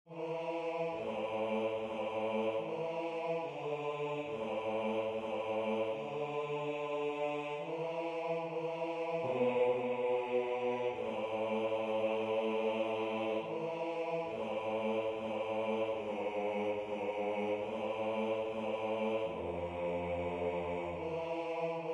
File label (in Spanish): Bajo; Atzokoak